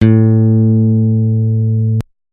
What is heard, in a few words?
single note, guitar